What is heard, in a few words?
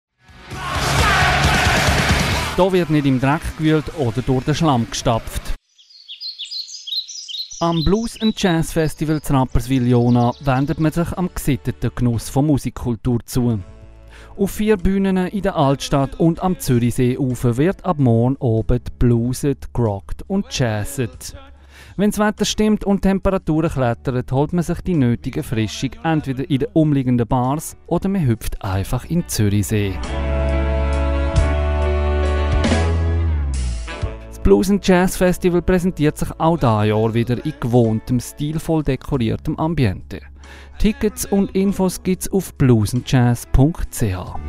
Blues n Jazz